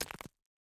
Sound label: environment pickaxe hit stone